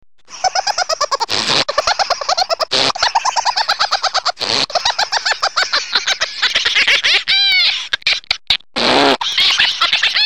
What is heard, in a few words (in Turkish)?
Harika gulme